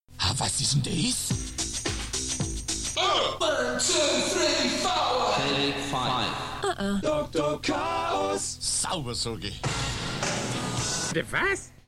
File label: jingle